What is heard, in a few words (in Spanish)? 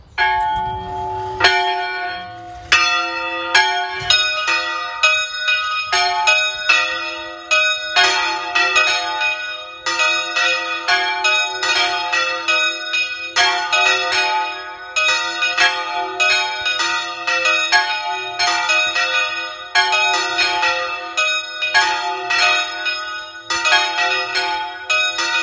Campanas; Huesa